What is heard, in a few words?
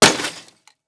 Impact Rock Hit Broken Television Plastic